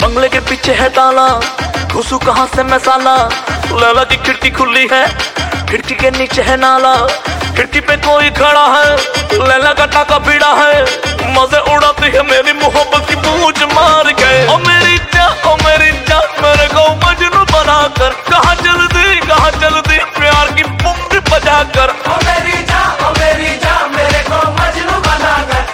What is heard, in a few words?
Pungi